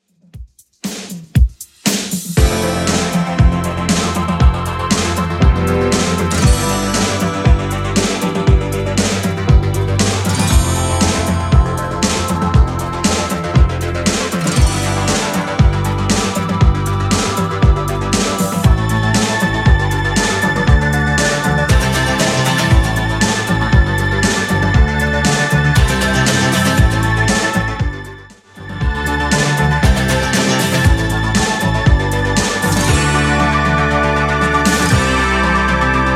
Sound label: 80s 118bpm; Xtend Edit